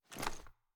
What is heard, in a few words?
ads down; gmod; viper